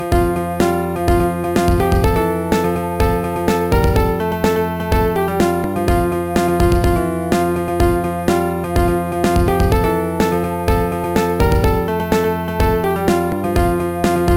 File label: piano concerto, trackers; Modland